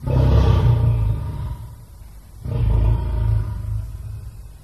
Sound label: lion; Cirque